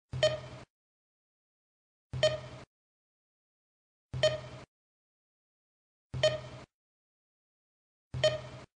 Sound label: Alarm Intro